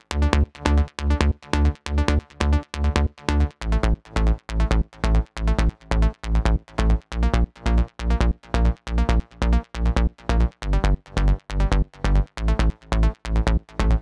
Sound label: 137BPM Bassline WET, Dminor